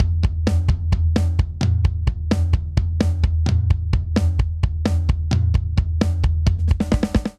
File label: Driving Rock Buildup 130 bpm; 4 4; MIDI Clips; Ableton; Factory Packs; Drum Booth